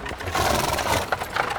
Wagons; Electa Quinney

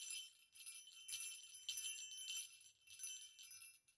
Bells, Small, Jingling, Rhythmic; Universal SFX

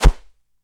Whoosh, Arrow, Feather, Thump; Universal SFX

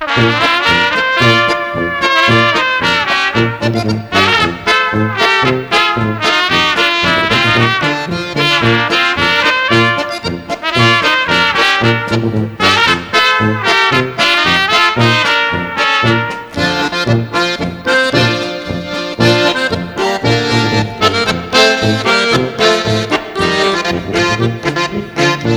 polka

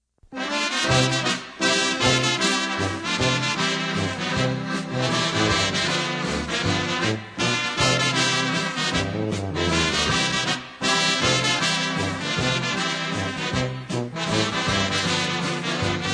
New Ulm Waltz; Sauk Prairie

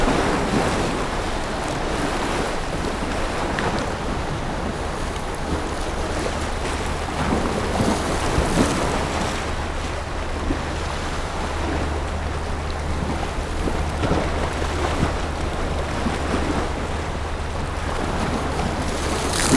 EXT Jeti Boat Cruising; EFX; Poetry Project

